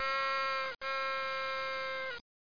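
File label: noise maker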